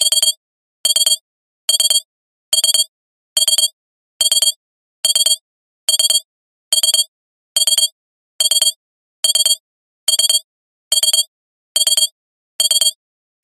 science fiction alarm beeps glassy digital 3 cluster medium